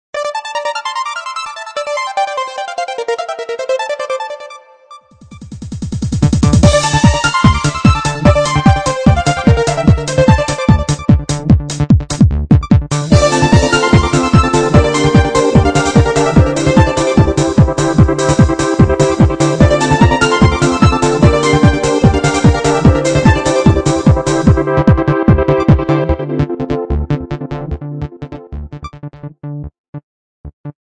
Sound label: Nokia Electric Eel, ringtone